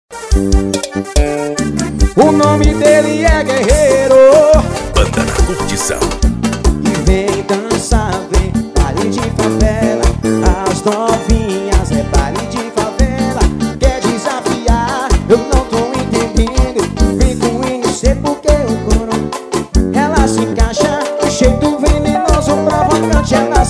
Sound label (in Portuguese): baile de favela